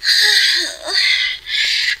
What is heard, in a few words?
sigh of a girl; ooh ahh